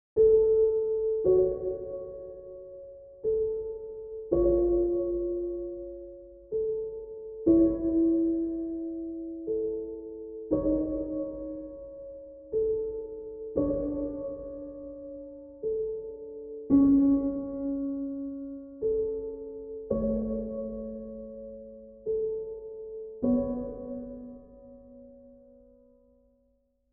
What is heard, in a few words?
Piano C Hall 3 A; QUANTEC